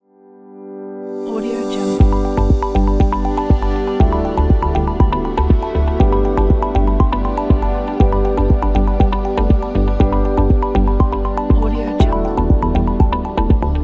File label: soft tech